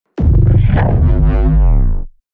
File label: Cube Angry Zap